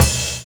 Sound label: RIDE FILL; Univers Sons